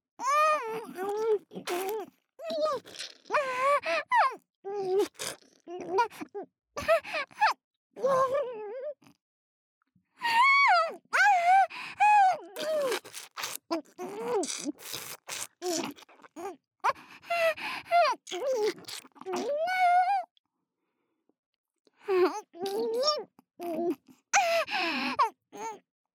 口内射精 ループ

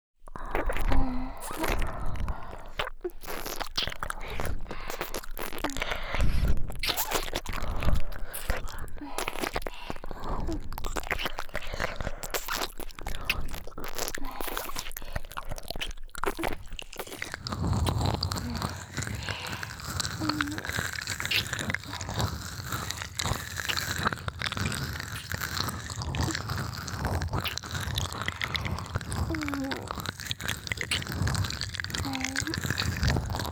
両耳舐めwithフェラチオ, ASMR

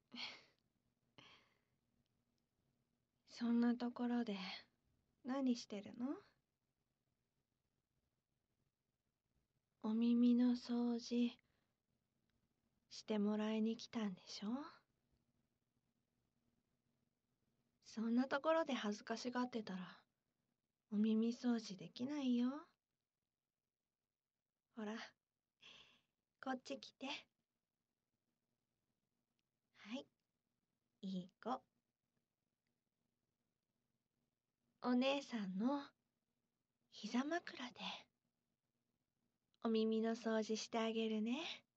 耳掃除

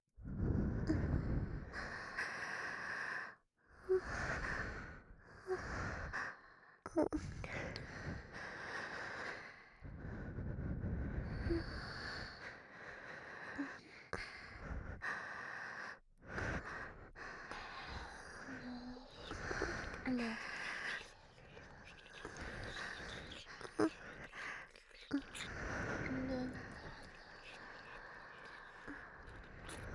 両耳喘ぎ＋舐めフェラ

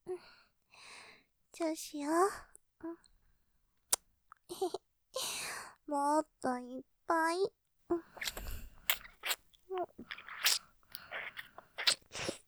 舐め, ディープキスねっとり, ASMR; キス?もっといっぱーい; ループで楽しむ！シェフの気まぐれ抜き抜きコース; モノラル

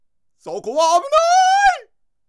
エラー音

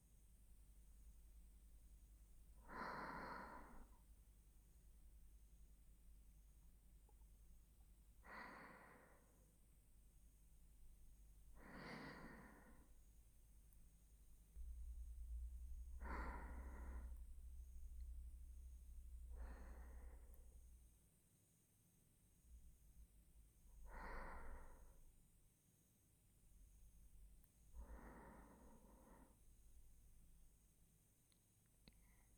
特典トラック 寝息(左側)

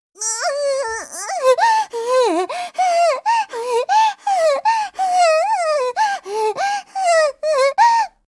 おぉぉぉ… おほぉ はぁっ

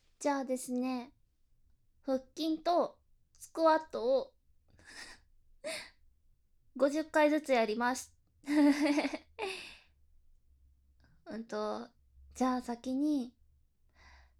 筋トレ&心音 バイノーラル, ASMR

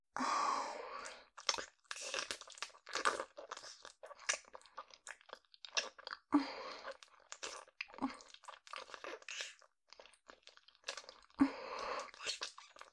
フェラチオ のみ

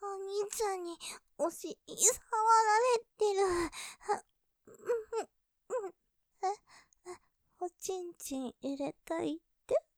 喘ぎ, ASMR; さらにとろとろ・頭ふわふわ・挿入前; モノラル; ループで楽しむ！シェフの気まぐれ抜き抜きコース

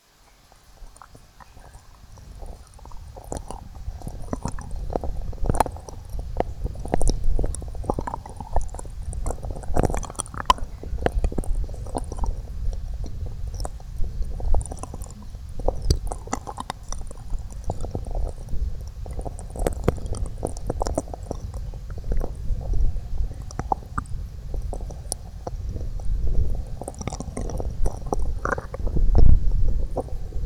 両耳奥舐め, ASMR

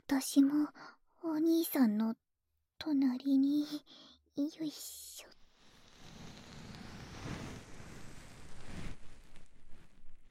耳舐め パート

